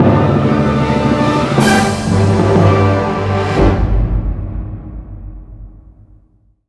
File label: music complete; Pilot Down Behind Enemy Lines; CHAPTER4